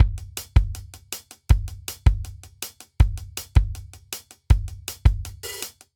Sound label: Energetic Pop Intro 160 bpm, Drum; 4 4; MIDI Clips; Ableton; Factory Packs; Drum Booth